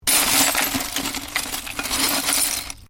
Glass shards movement; Material components for constructing more complex sounds; Sound Effects:Materials

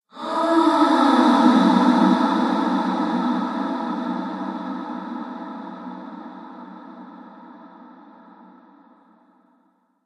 Femme Fatale Moaning With Reverb; Sex Sound Effects

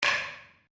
Wood On Wood

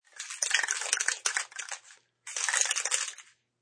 Shaking a spray paint or other aerosol can.; Spray Paint Can Shake; Spraying Sound Effects; Objects

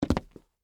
Dress Shoes Jump, Concrete; Footsteps; Footsteps (Sound Effects:Human)

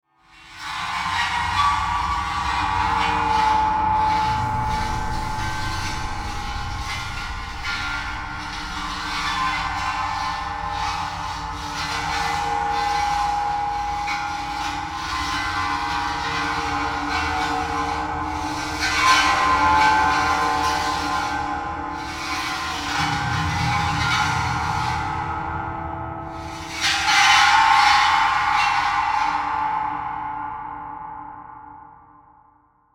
Bed Scrapes Metallic Reverb Eerie; Blastwave

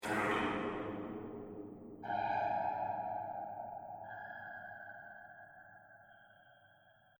Distant Impact; Distant Ko Impact; Miscellaneous Impact Sound Effects; Andrew Fleming

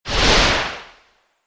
Modulation Whoosh; Whoosh Sound Effects

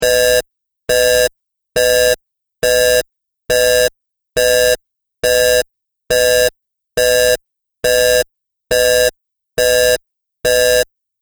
Alarm Beeps; Beep Sound Effects

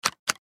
Switch Slide; Web Interactive; Technology; Multimedia; Slides; Objects

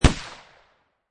Pistol Rhuger 357 Single Shot Designed; Gun / Pistol: Rhuger 357, Single shot, Designed

